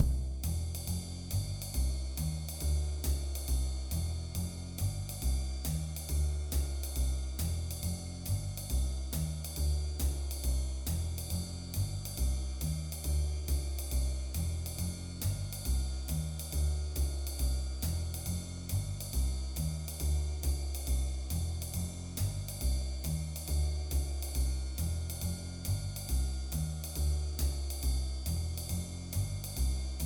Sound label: swing beat